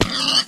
Pig Boost; Minecraft: New Nintendo 3DS Edition

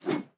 Swing Sword Short

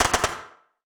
Cracker

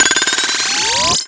Bomb Touch; Bomb SFX Touch 01

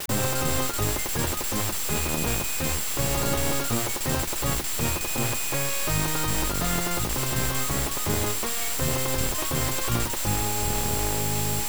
Team Jingle

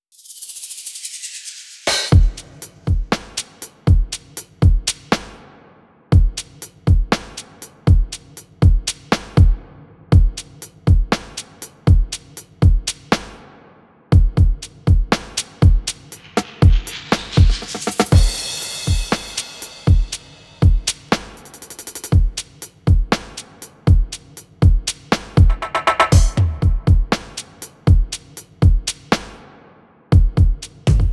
Drums 60

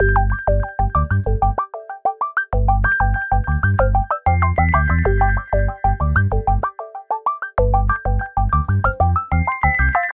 Jingle Waiting Loop; Super Rub 'a' Dub; PS3

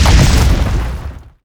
Enemy Roadmechanic Summon Wall

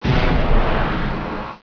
Die; Virus: The Game; Lasr

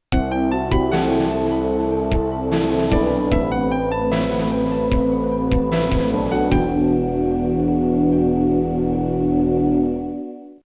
Continue; Phelios

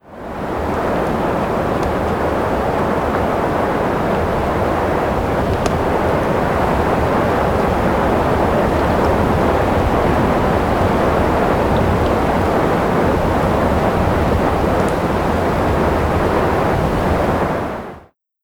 Cities Wind

